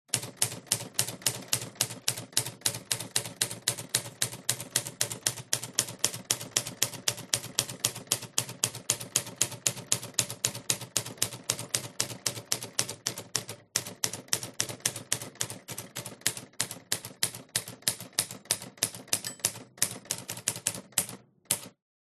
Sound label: typwriter Smith & Corona 1960 key press multiple times